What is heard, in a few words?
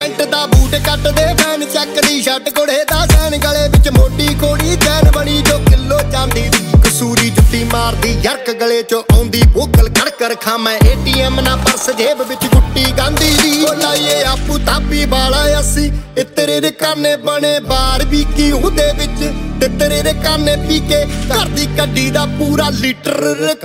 Folk Flow Ringtone